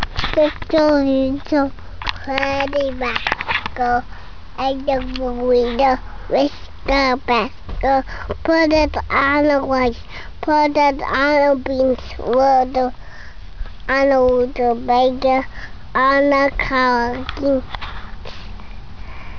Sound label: singing